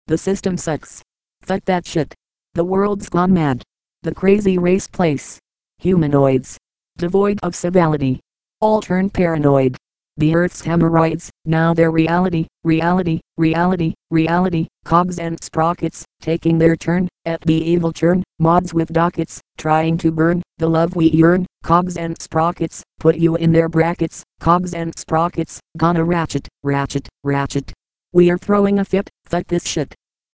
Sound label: Voice Synth cogs and sprockets